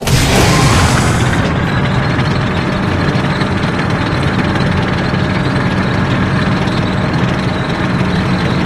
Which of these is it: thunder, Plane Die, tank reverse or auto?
tank reverse